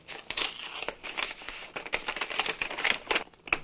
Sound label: paper rustle